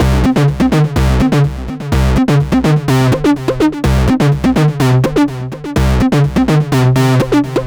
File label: Bass